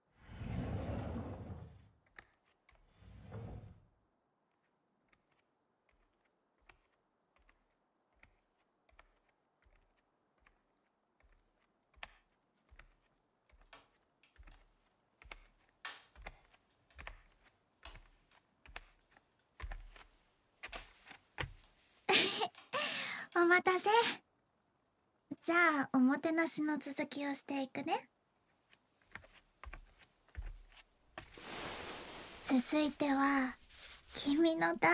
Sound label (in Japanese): ねこじゃらし で 優しく 耳かき〜右耳・左耳〜